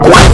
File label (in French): doodle touche monstre; DoodleJump